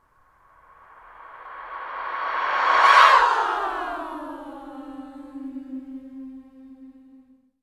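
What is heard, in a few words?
female swoop reverse, voices; SWOOPING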